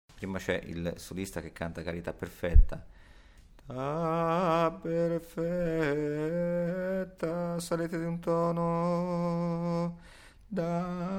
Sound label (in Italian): tenori alto